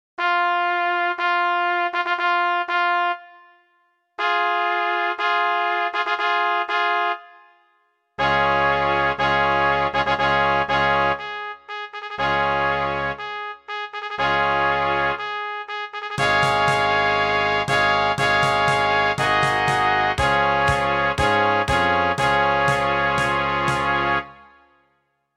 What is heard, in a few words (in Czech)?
Fanfary